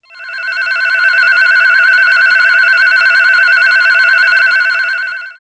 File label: charge